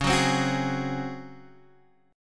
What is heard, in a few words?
C sharp Major 7th Suspended 4th, Guitar, Half Step Down; chord